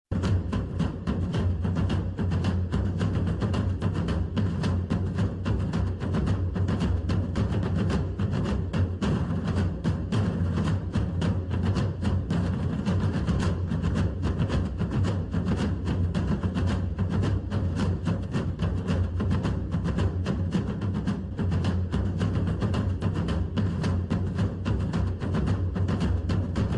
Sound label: grenadiers march drum only; ultimate admiral age of sail windows gamerip 2020